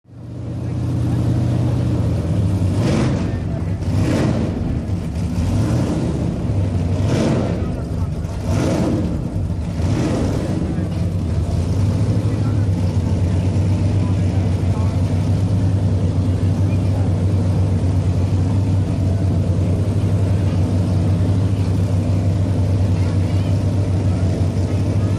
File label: Power Boat Pit Ambi, off shore power boat